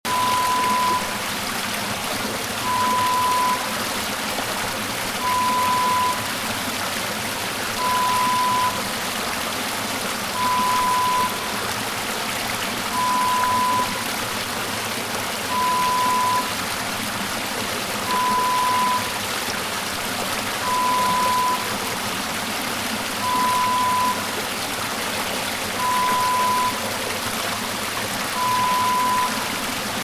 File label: Babbling brook